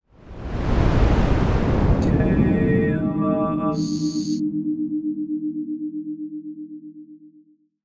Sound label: jingle